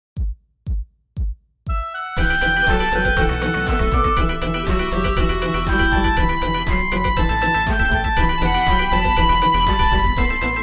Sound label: instrumental; New Hope Music